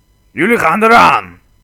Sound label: charge